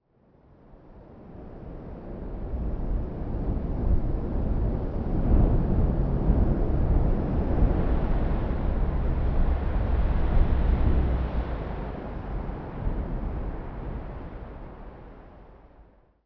dun wind

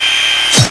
attack; YOSTER A

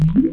skeggiold move

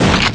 hardrock titan attack

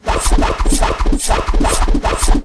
cross rain

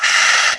garden watcher attack

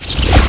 ENERGY CANNONADE

